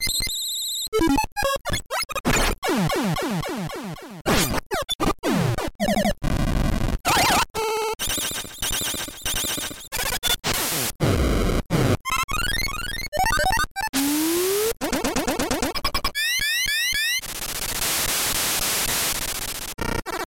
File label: all sound effects; mega man